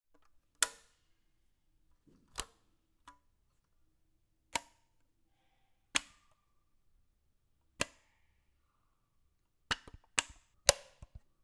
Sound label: Light Switch, Hotel, Vietnam; starbites